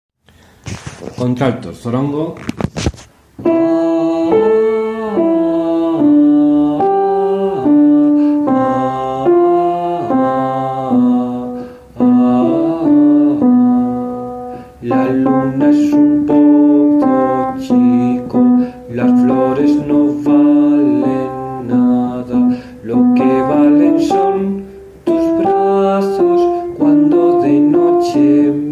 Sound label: altos